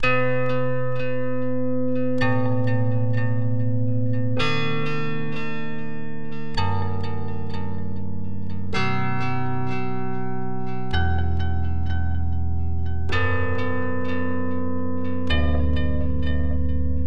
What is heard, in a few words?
Guitar 16 bar